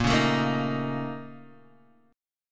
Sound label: A sharp Minor Major 11th, A sharp, down, Guitar, Half Step Down